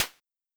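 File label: Hi-Hat Open, Luxor Passet; Casio PT-30; Drums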